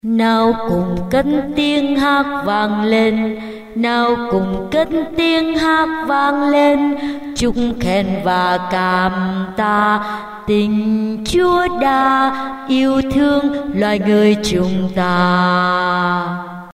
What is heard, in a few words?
basso alto